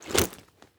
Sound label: foley soldier gear equipment rattle movement light; goddess of trampling